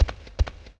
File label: goblin run